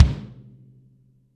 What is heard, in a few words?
3 qtr damped kick ff, deep house single hits